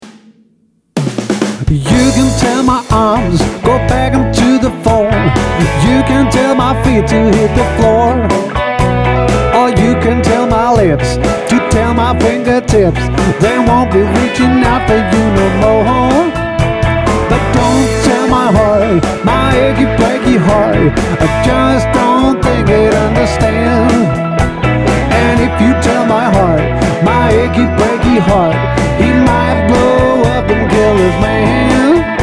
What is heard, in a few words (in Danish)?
Festorkester Trio